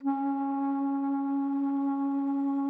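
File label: C#3, bass flute; Xsample VOL-2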